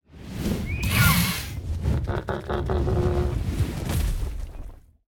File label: Griffin sound